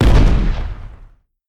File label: imp mortar